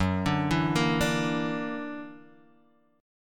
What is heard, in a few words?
F sharp Augmented 7th F sharp Guitar Drop D